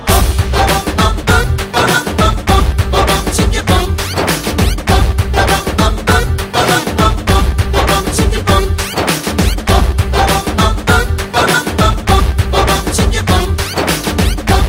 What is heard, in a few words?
BG Tone